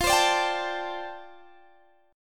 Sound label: F 6th Add 9th F down Guitar DADGAD, chord